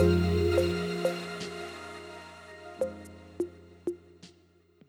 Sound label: Fizzle Pad; Pads; BB 2HTC Samples Vol 4